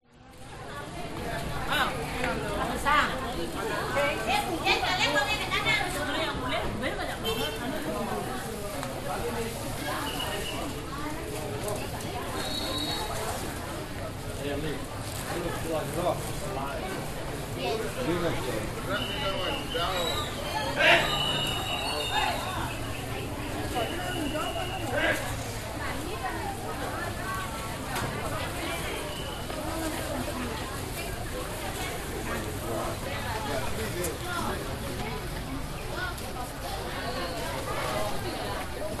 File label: market street people close up indonesia ubud